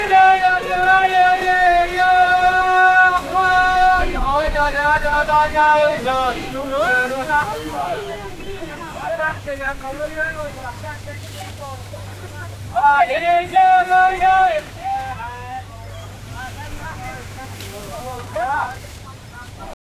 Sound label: chennai kasimedu fish mar, coastal